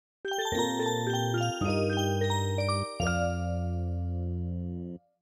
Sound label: MIDI Inn